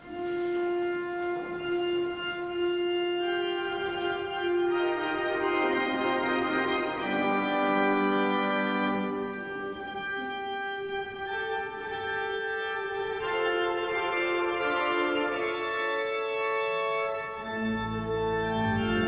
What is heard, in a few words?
fanfare; Organ fanfare; Pentecost; Mass: Darke in F